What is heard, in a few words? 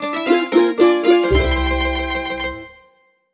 Jingle Salon Finish Table; Clubhouse Games: 51 Worldwide Classics